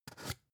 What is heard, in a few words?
spice jar big scratch open; industrie wirtschaft; gastronomie